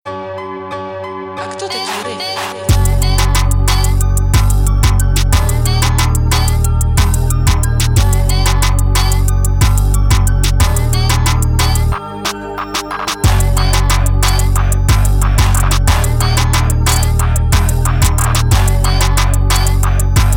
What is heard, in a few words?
Jerk Type Beat